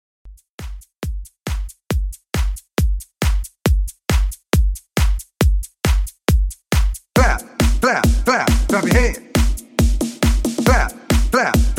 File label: Dance 137Bpm